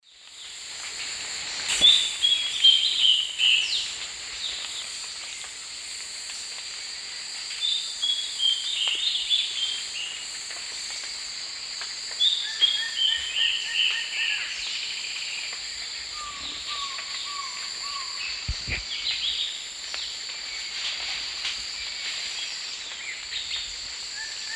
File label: Hypocnemis hypoxantha, song; S. America; Eastern Lowlands